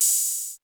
808OPEN, Akai MPC-2000; Drums

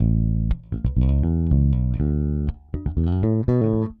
Pop, Bass; Sonic Foundry (Sony Creative Software); Bass X

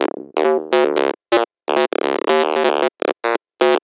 Dirty Bass; Sonic Foundry (Sony Creative Software); Underground UK House & Electro

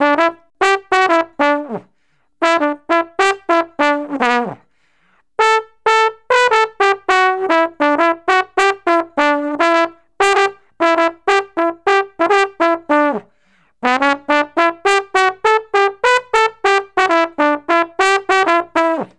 Trombone Solo, Jazz

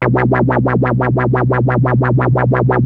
ARP 2600 A3, synthesizer; Hollywood Edge - Giorgio Moroder Rare Synthesizer Collection

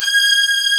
C#5, STRGS; East West; Global Sounds

